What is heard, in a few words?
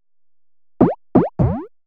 HOUSE FX 125